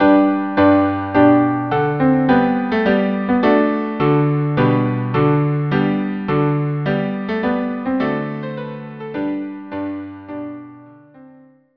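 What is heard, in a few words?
trumpet tune cello piano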